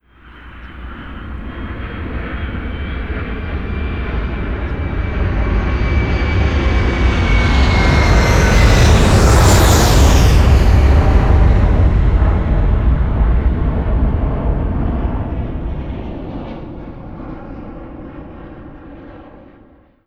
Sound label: escape jet stereo; Call of Duty Modern Warfare